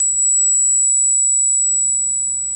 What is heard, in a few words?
Second Harmonic